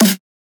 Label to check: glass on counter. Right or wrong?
Wrong